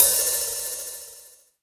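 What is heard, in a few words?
hi-hat OPEN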